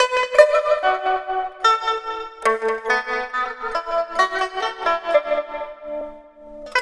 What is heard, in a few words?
PIPA LP FX; USB Soundscan vol.60; Asian Traditions; AKAI